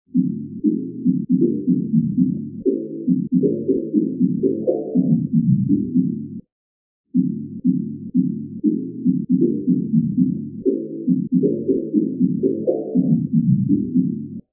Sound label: ethereal